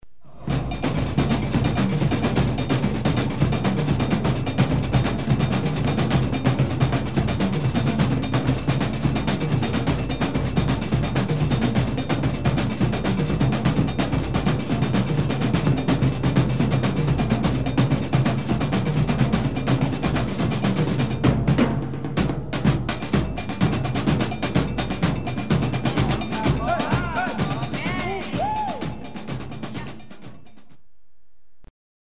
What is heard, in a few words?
drum calypso